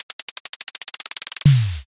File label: drumroll